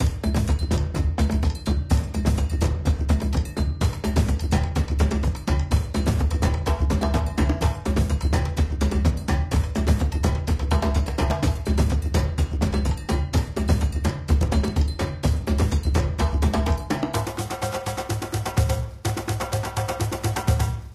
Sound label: Tribal; Little Big City 2